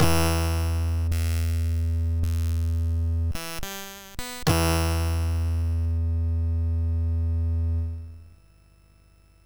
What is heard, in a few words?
MOS6581; MOS6581R3